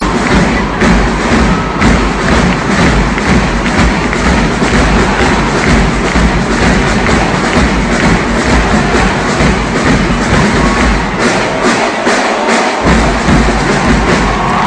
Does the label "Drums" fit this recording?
Yes